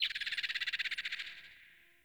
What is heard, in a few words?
Birds Low; Ambience Plains Landscape; Warframe; Eidolon Plains